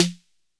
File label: SD 808 Tail